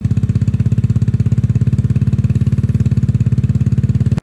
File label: gb350 muffler